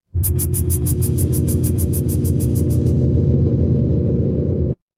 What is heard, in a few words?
mechanical machine